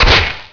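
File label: pendulum hit, traps; quakemapping; mod xj18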